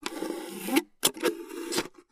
Lid is removed from a large drinking cup and straw is inserted through lid. Lid O/C Straw, Insert Open, Close